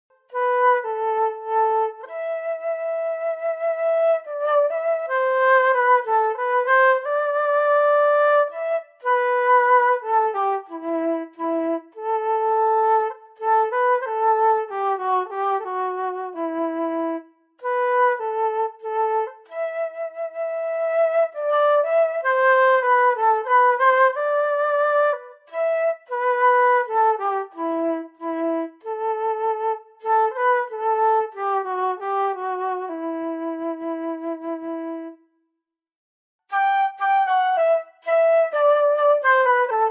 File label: Flute & Violin Snippets